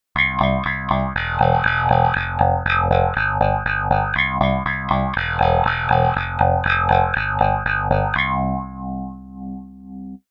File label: Bass Effector Wah